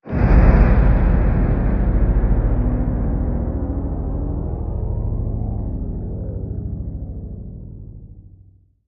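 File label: Whoosh Residual Darkness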